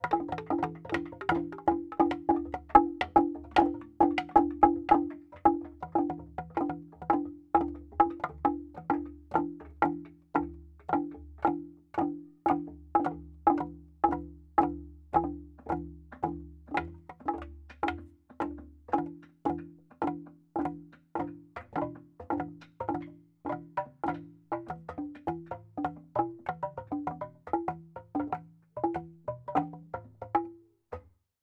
musical large xylophone forest played by children recorded underneath scotland